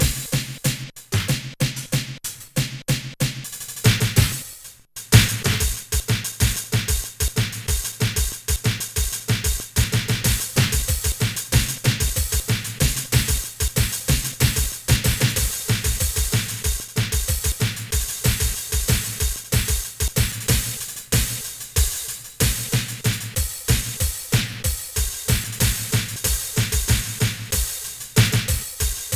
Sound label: impulse beat; Trackers; Modland